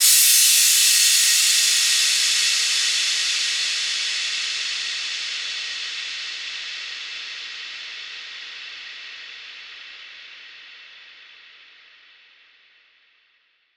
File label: cymbal crash; that huge Au5 crash